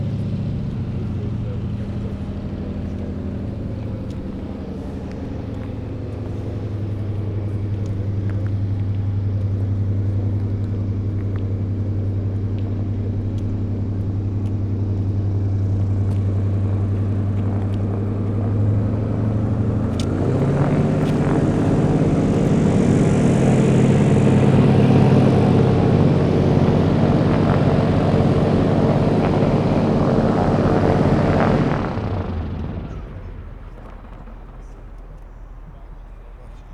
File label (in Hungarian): járó motor felpörgetése, leállítása, repülő egymotoros; budaorsi repter